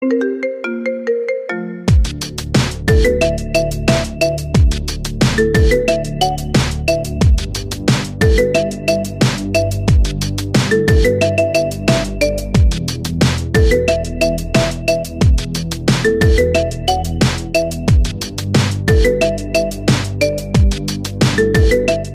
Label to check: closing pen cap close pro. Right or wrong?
Wrong